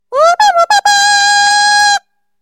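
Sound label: pioneer bugle rise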